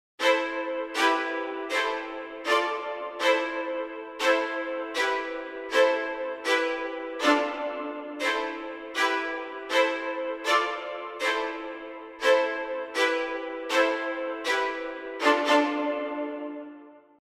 Fantasy Strings

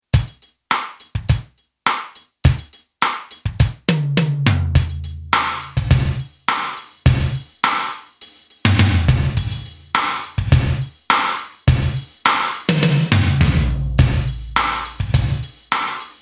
Lexicon Alex Gated

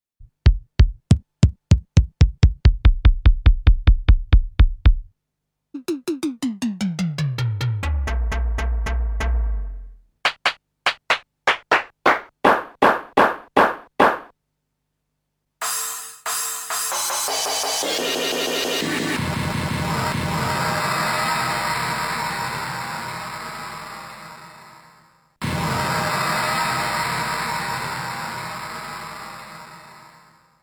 Drumtraks Detune

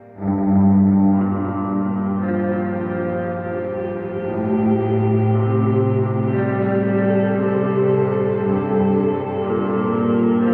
Dome Reverb; Source Audio Collider

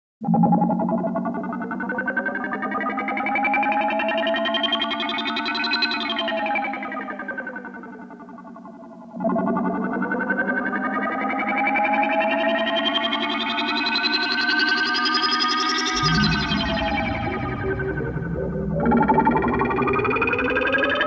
Yamaha SPX90 Echo Synth

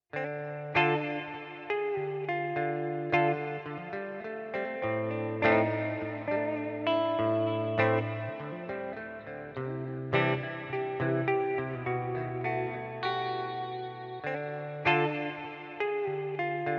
Plate Reverb Neunaber Immerse Reverberator MK II

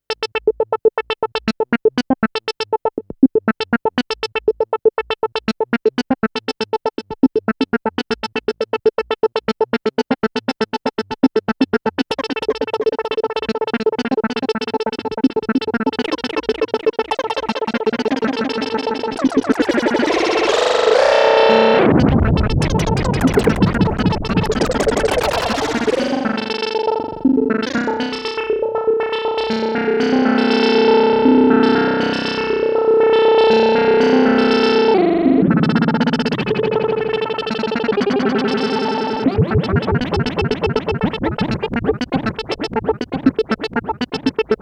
Korg EA-1 Delay

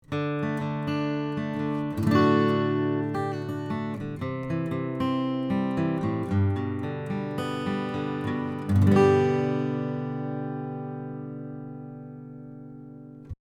KM 84 Acoustic Git Picking 25cm 15 Fret

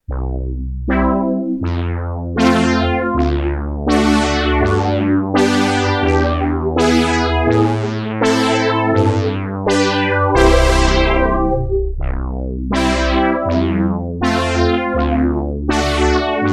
Polysix

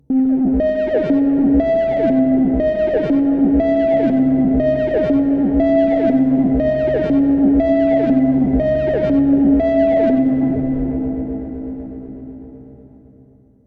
Korg minilogue xd

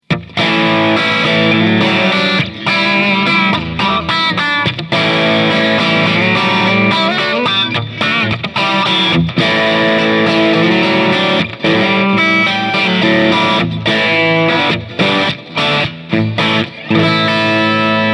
Crunch Bridge Pickups Single Coil Humbucker

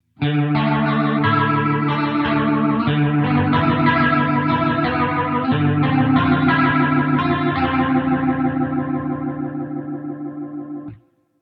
psycho

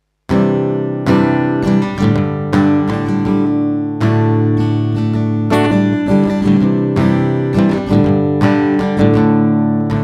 boost; tech21

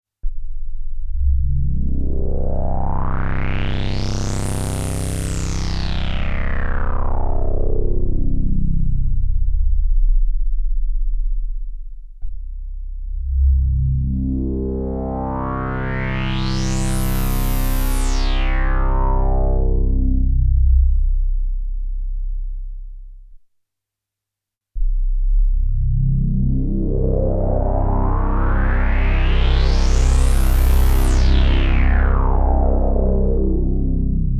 Behringer Pro 800 Dark Filter Sweeps

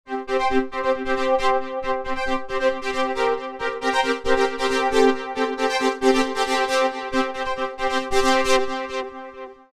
Hydrasynth rhythm arp

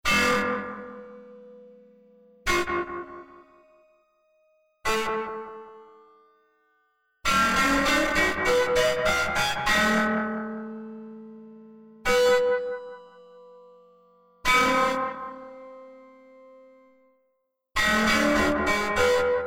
T2 Gamelan More Disharmonics

